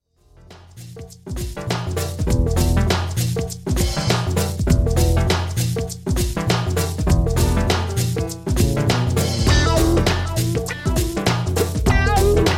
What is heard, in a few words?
jazzy